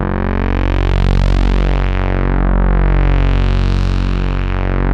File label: PULZE SWEEP, BASSES; Masterbits; Vintage Voltage